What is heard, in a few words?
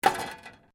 METAL SCRAPS MOVE